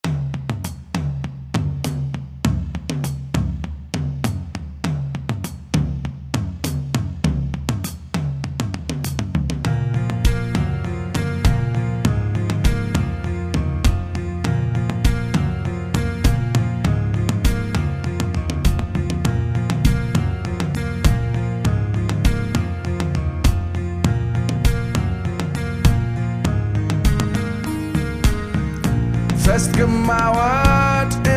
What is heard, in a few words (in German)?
glocken medley